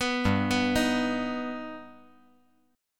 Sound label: G Augmented